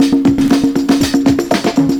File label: PERCS, 70 s Breakbeats